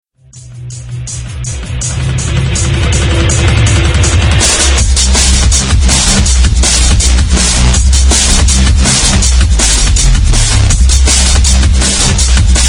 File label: dark wave